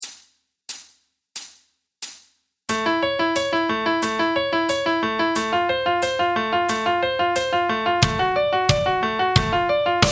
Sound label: Guitar Arpeggio Exercise Backing Track